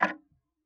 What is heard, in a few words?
Tick, Clocks Kitchen Clock